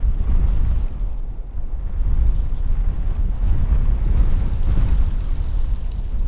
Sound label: Sea